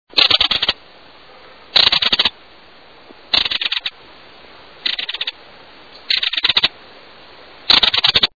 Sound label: Belted Kingfisher